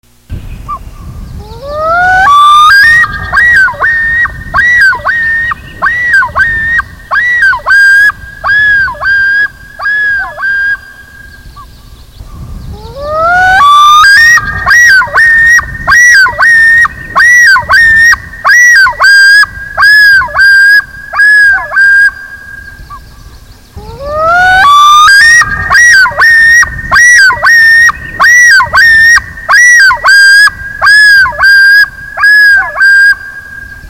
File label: yodel; UWEXLakes; convention 2016; workshops